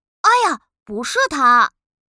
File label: wrong; baoqingtian